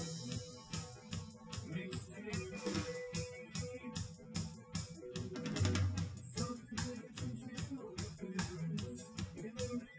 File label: wdrums live rec; SiSEC08; SiSEC underdetermined